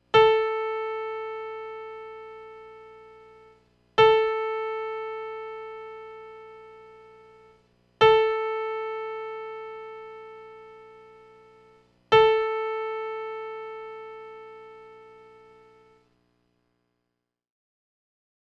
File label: etude swing alto sax reference